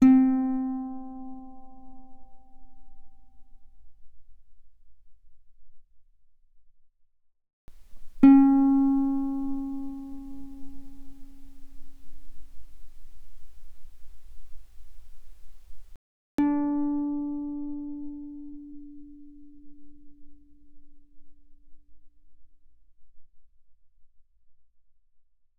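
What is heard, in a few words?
Guitar fortissimo sul D C4 Ab4; mono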